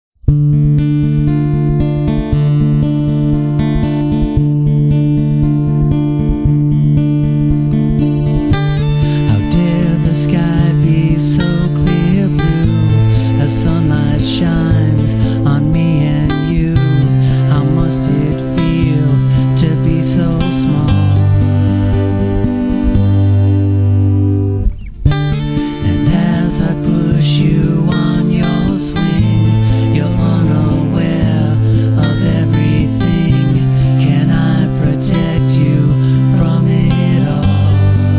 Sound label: Swing Song